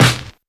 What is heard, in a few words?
perfect snare b key, acoustic snare tuned to the B note